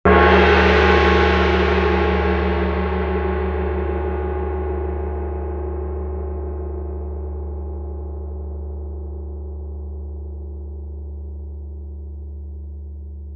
PERCUS

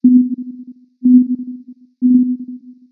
detect Magic; dnd; magicApp

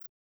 Mentioned; mention sounds; overte; Hifi Experiments

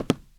FOOTSTEPS WOOD

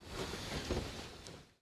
SCREEN DOOR WIND

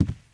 FOOTSTEPS WOOD

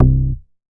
Moog; leo-sounds

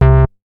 Moog Nes

